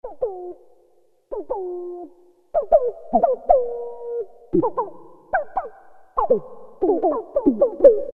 DogsBark, Roland MKS-50; Factory examples

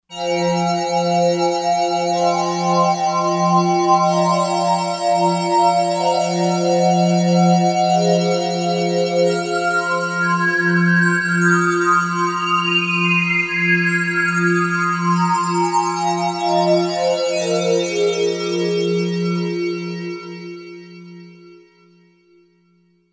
Pad Freeze Pad, synth

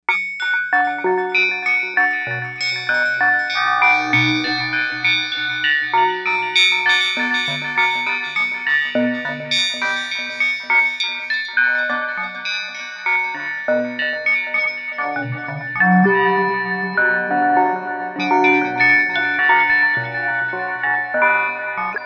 Large Bell LFO; Novation UltraNova; Factory Patches

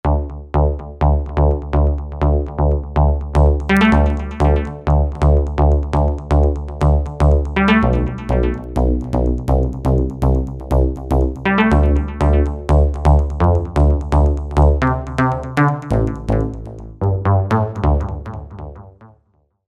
Dancer Bass

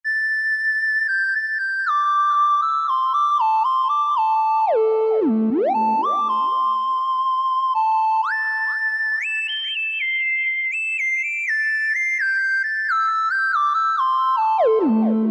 Hip Lead, Synth & Fx; Factory Voices

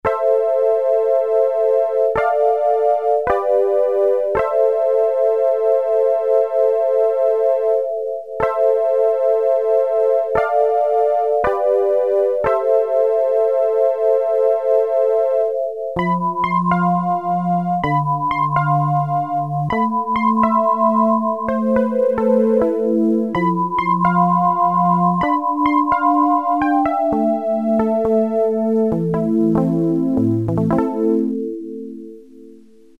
FANTASTIC PIANO, Casio CZ-1000

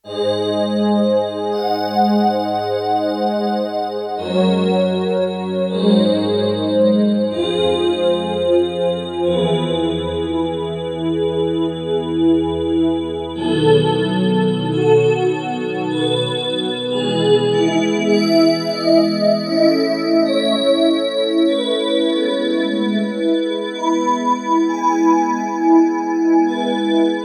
Syn Choir, Roland JX-1; Factory presets; Additional factory patches

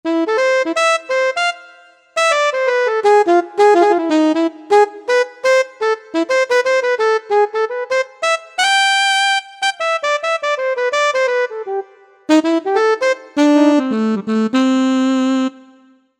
Alto Sax

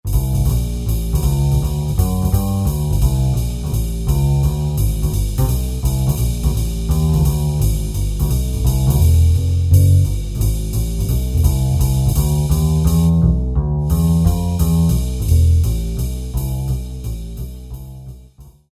Snappy Jazz Bass; Kurzweil K2000 Orchestral ROM

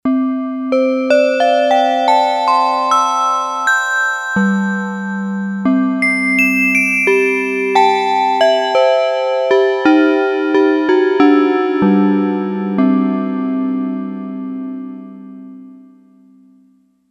Yamaha DX11 Tube Bell; Factory Preset Voices

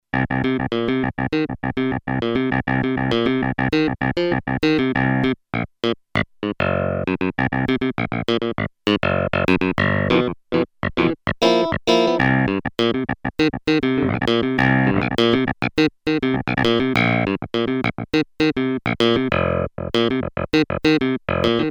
Clavinet

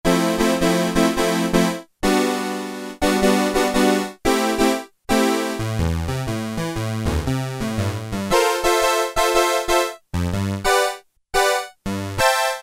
Korg Poly-800; Synthicord II

